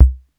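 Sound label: TR66 bass drum; Crypton - DrumBase5000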